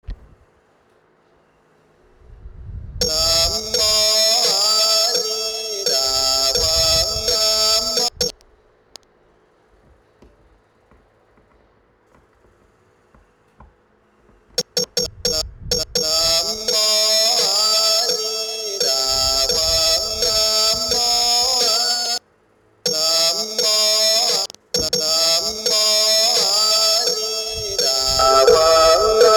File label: distorted chant electronic; 2019